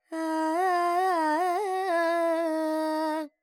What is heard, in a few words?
Phrygian, Vocals, Carnatic Female, Alaps, Dry; Sample Banks